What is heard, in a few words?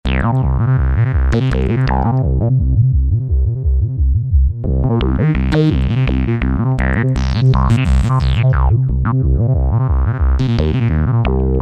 Aciiid Bass, Roland SR-JV80-19 House Collection